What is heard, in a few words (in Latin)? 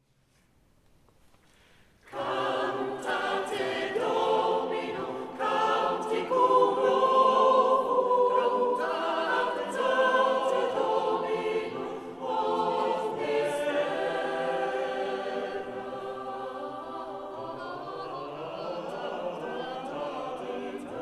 Cantate Domino; Hassler